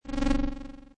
Komputer, Sci-fi, short oscillating, electrical charging sound; Switch on instrument, computer, or displaying pass-by etc.